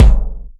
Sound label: Kick Skin Loose, Drums